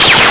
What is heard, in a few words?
Laser (Enemy); HAVOC; RB Sounds